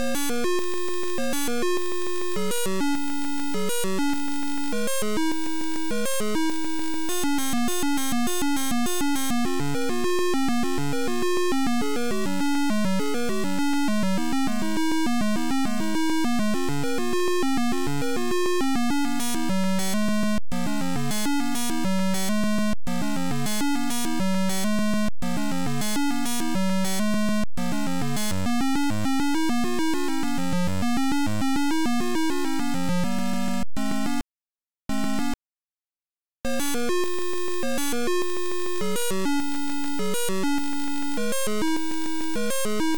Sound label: Title Beeper